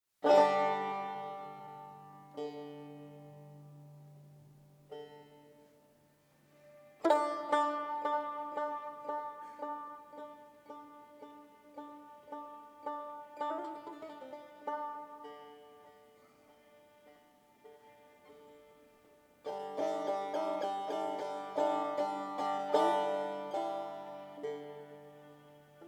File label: Taknavazie Tar; تکنوازی تا